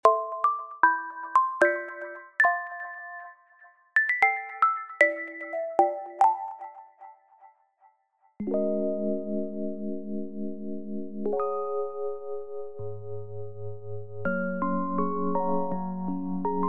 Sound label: keys; hive eclipse